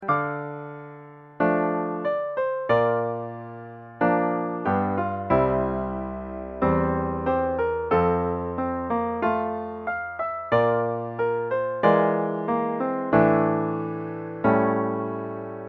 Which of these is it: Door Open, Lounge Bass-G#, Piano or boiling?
Piano